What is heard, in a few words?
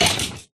hurt, stray; Minecraft